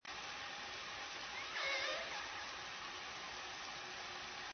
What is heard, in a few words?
transient call